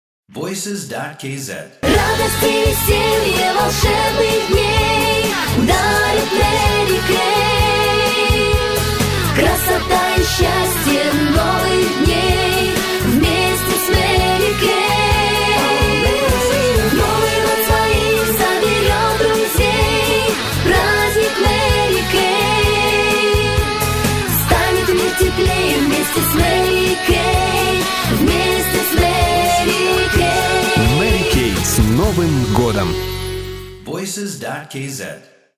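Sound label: Russian vocal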